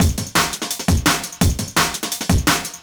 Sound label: Breaks 170, Drum&Bass; EZRollers